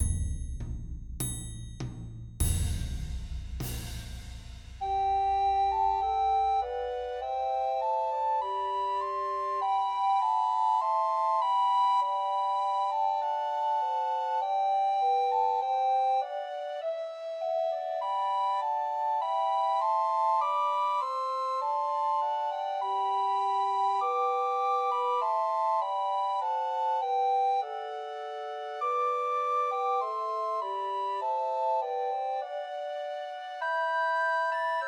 See Amid the Winters Snow Christmas Trios for Two Descant and One Treble Recorder